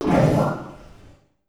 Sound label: Worm voice